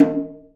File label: Java Drums; E-MU Producer Series Vol. 5 – 3-D Audio Collection; 3DSprints; 3DJavaDrums